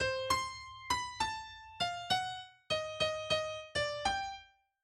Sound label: Jingle